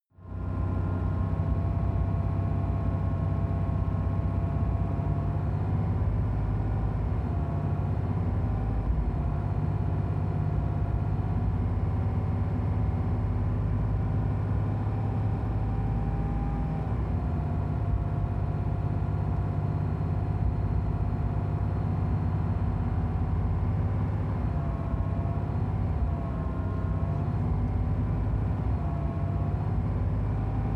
sports car int engine drive slow; verkehr transport; autos

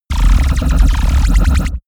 space laser blaster cannon; krieg-waffen; soundpool

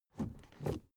suv idle 1st gear change slow; verkehr transport; autos

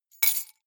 key ring large drop stone soft; objekte; schluessel

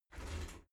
shower slide door slide short close

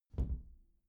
glass door close soft

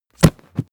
body fall generic mid tumble; krieg-waffen; nahkampf; soundpool

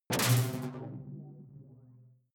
sci-fi alien splash detuned; swooshes-swishes

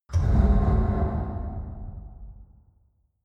metal large boom

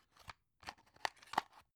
drug close hard; berufe; arzt

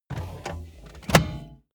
car bmw e46 interior seat pull forward short hard

